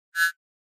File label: Flash Beep Elect Buzz, flash beep